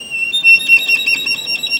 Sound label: VIOLIN BIRDS; Best Service; World Colours